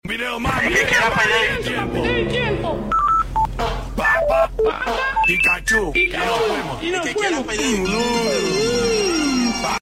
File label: salsa